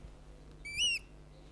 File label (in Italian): civetta Athene noctua giovane allarme; luglio 2005 Boltiere; aspetto terrifico: i colpi di becco della Civetta; Natura Mediterraneo